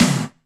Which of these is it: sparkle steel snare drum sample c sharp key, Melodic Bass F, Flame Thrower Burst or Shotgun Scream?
sparkle steel snare drum sample c sharp key